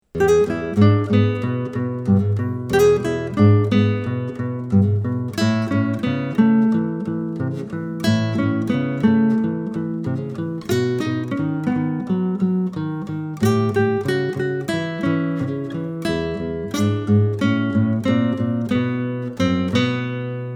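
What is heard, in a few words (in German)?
Blues Style Duo, Akustische Gitarre/Klassische Gitarre: Duette; leicht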